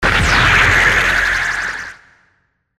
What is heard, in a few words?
CANNON